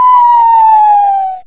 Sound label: lose; Kenney's Sound Pack; Retro Sounds 1